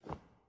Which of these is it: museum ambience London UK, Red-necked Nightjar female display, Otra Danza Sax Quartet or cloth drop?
cloth drop